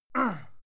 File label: male fight ah soft; schreie; menschen; soundpool